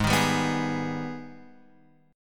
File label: G sharp Minor Major 7th G sharp down Guitar Open F; strummed; JGuitar